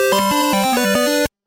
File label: wave complete; Tower Defense; freepixel